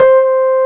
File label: suitcase; Keyboards Old School; USB Soundscan vol.09; AKAI